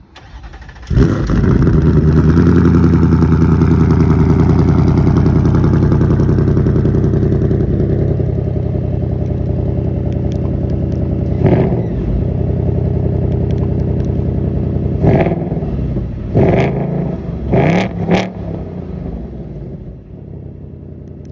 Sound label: ford mustang gt